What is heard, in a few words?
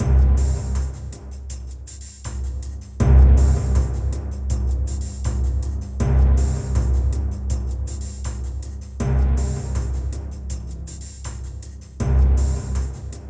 Line Of Drums; lucius ii the prophecy gamerip 2015 pc macos linux